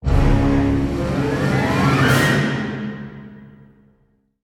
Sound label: Octopus Stinger; lego dimensions soundtrack